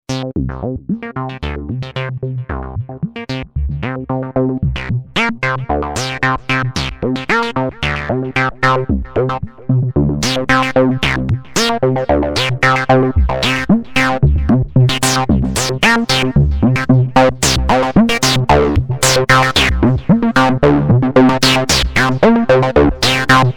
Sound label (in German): 4 Distortion Modi; Xenophone